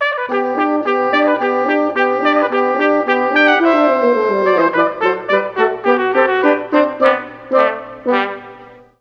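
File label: horn trumpet oboe; Juilliard Music Adventure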